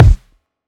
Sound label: mid heavy kick drum single hit e key, bass drum single shot; kick drum samples